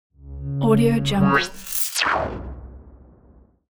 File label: a glitch logo